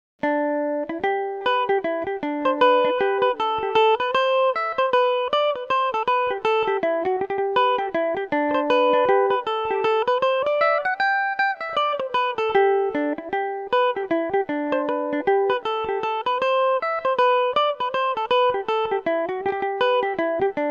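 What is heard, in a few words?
G major, irish mandolin; music in the glen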